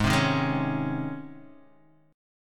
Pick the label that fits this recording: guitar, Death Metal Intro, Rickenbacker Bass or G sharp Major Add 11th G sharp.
G sharp Major Add 11th G sharp